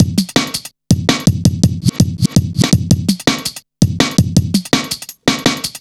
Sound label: 165, Drum Loops, Drum & Bass; Total Drum&Bass